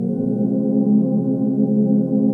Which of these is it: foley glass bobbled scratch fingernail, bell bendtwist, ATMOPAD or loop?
ATMOPAD